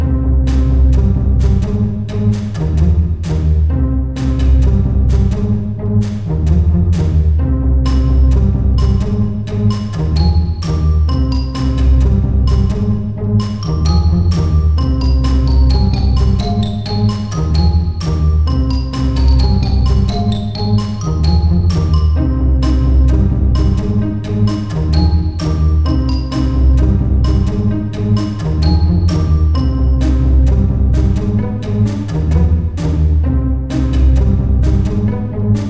Intense

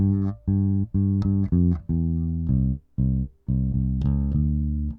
bass guitar B

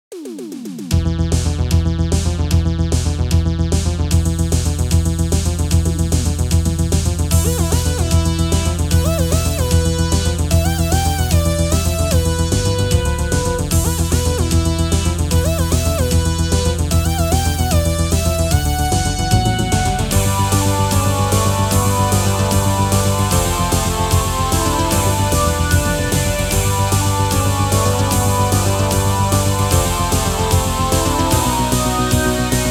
80s TV Theme

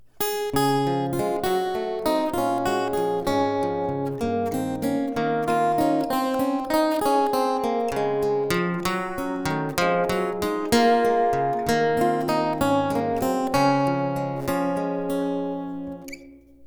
guitars

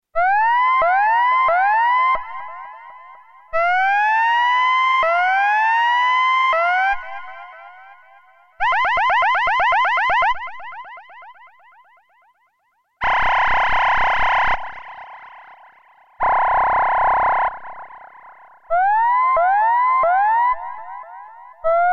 siren

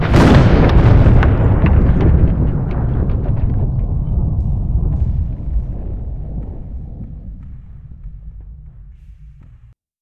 rock breaking

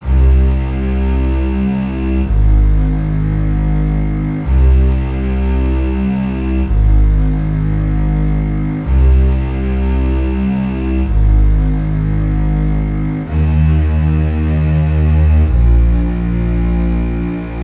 Dark Blue (Short Bass Loop)

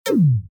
Menu Choice

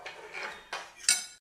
pick cutlery

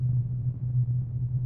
ambient

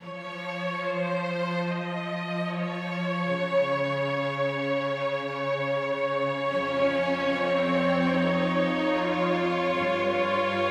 Game Over

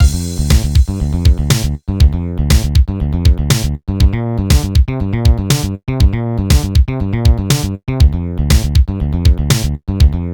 Investigating a crime at night midi

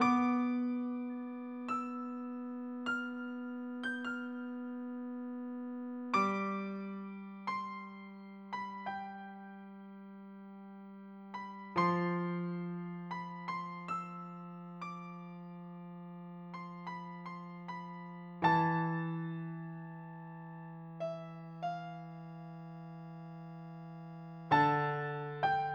sad piano

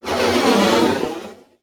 sci-fi

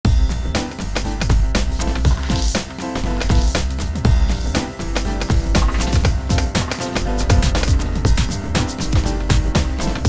City Loop

